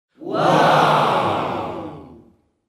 audience wow

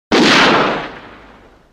gunshot; cinesound

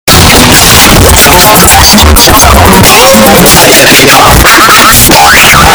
robot logo super mega earrape; klasky csupo

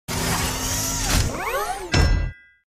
iron man suit up sound effects

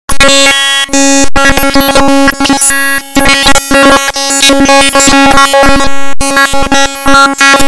null glitch

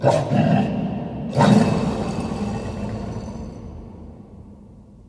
growl; trtf 5 tortured lockjaw

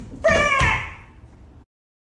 elderly scream

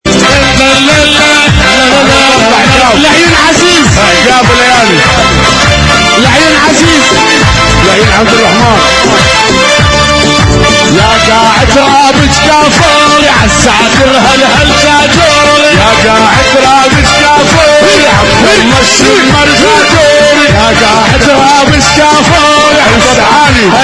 iraqi music; on drugs

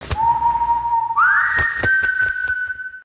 whistle; red bull

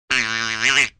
gooly ahh laugh

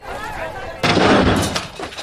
crowd and object fall

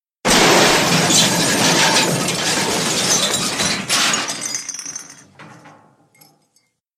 crash long

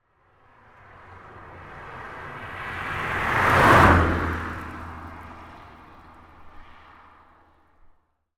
car passing by

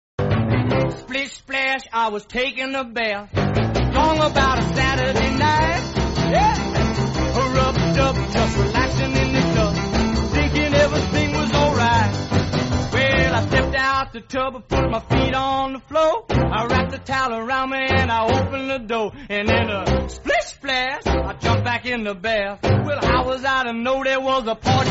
slash splash

